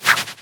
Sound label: windup; Shinobi